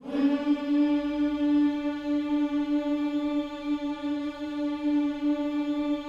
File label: HMMS D 4, GOSPEL HMM; Spectrasonics; Vocal Planet